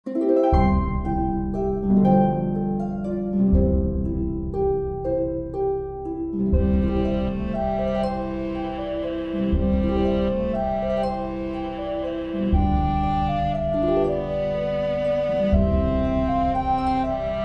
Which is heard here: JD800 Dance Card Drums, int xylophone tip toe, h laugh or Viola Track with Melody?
Viola Track with Melody